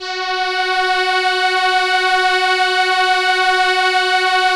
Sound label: ARP STR ENSL, First Call Vintage Keyboards; SE ENSMBL